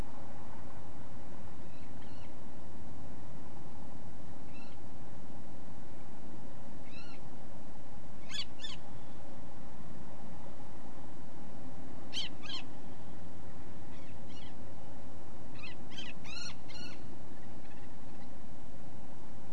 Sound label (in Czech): ostříž jižní Falco eleonorae